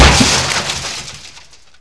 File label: damage, car; q3rally